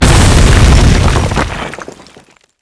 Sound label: Fire hit